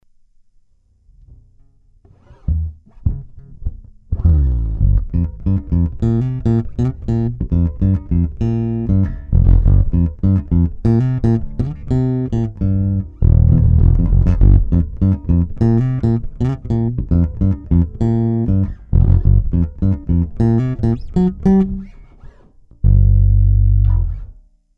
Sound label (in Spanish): base funk low hi mid, bajo